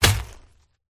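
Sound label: werewolf attack contact bottom; oboroten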